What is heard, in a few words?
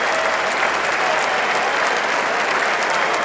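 Basketball Crowd Injury Loop; Crowds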